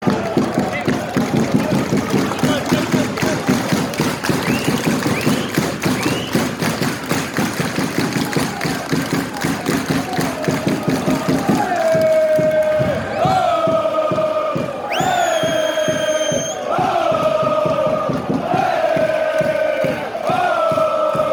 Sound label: eee ooo, chants